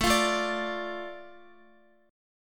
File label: A Suspended 4th Sharp 5th, Guitar; Asus4#5; strummed